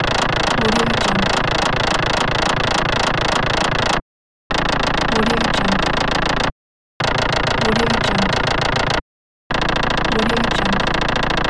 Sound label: mechanism